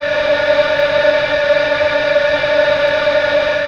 "eyhe", Voice

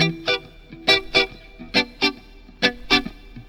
guitar ragga loop; Loops; Phonky

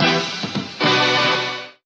two tones, Stabs

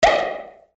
pop, Misc Percussion Pop

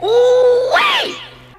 "ooh wee", voice; ooh ahh

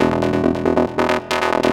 arpeggio

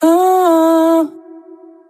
ooh SUNG, Voice

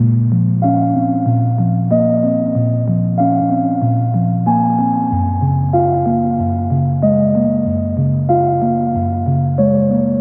keys

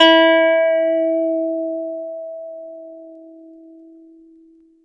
tuning, Guitar; Notes

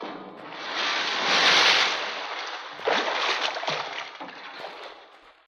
splash

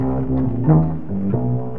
bass, Loops

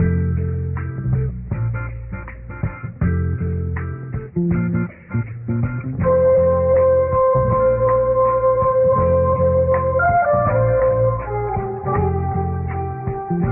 guitar, Loops, Phonky

